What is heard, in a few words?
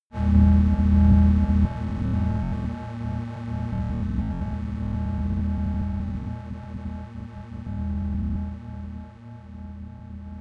dark engine, drones